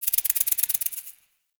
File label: Interior Spider Crawling; Warframe; Duviri Murmur; Ambience